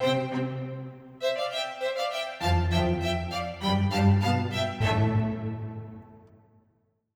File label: 100bpm light staccato cello transition